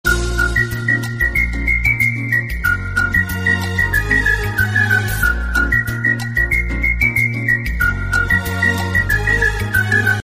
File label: romantic ringtone; bollywood